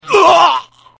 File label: stabbed; freak fortress 2; library of ruina; roland